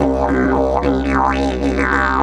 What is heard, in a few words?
108, DIDGE 108BPM